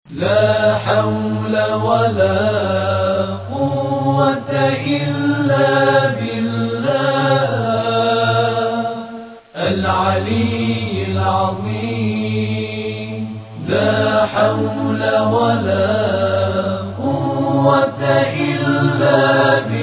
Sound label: prayer ringtone